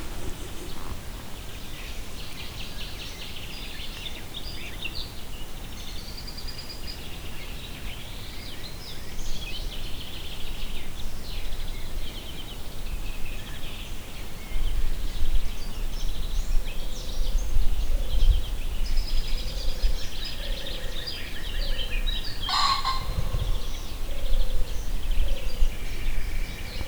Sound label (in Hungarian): ártéri erdő hangulat, fácán; termeszetben